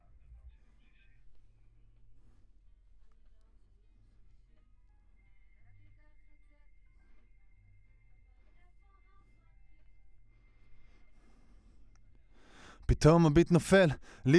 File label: Voc